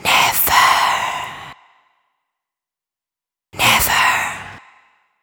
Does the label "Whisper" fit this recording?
Yes